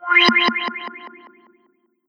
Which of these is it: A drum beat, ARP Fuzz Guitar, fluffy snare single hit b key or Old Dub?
Old Dub